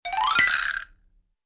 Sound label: quick xylo gliss up with vibraslap